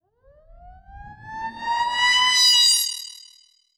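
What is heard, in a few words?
alarm siren, filter processed, 170bpm; musicradar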